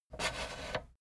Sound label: fader plastic scrape single down halfway slow